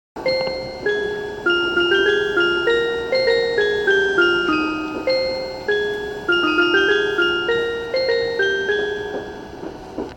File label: chime; shikoku